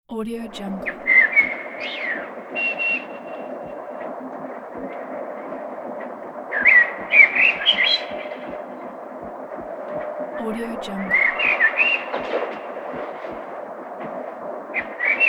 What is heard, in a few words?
city birds